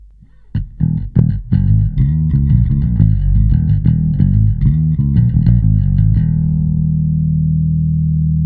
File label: Bass bypass bass over